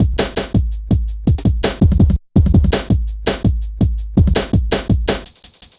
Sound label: Ezee Rolla, Drum Loops, Jungle, 165.5